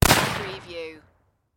Rifle Springfield 1903

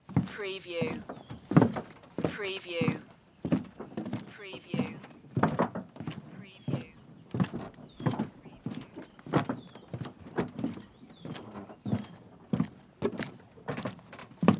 Footstep Dock Walk

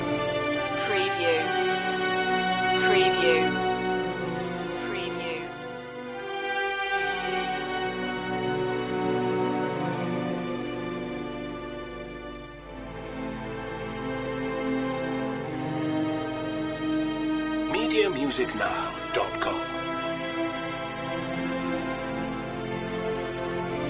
beneath the surface loop

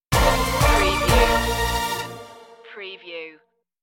shake shake stinger

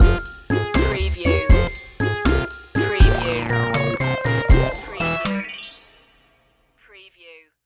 retro video game lazy world stinger

